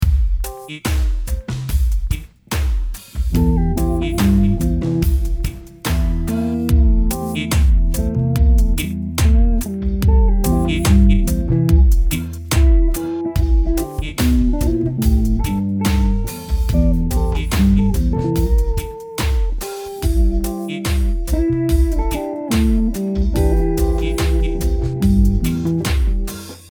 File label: slo funk